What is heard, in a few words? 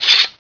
SWORD